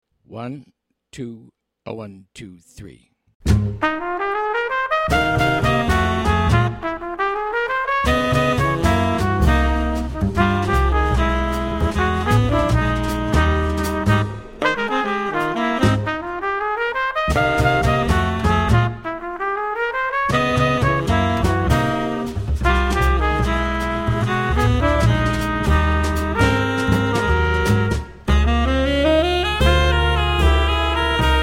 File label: minus Piano